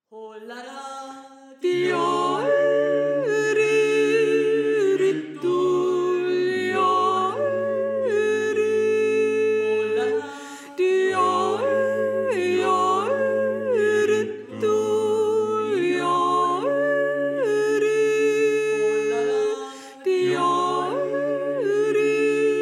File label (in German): Sopran